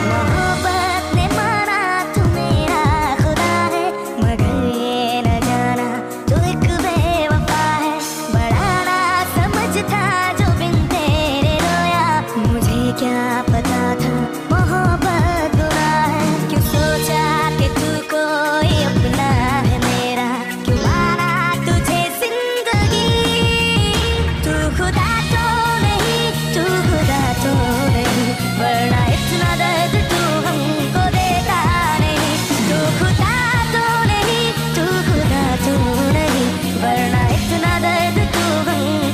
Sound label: Sad Song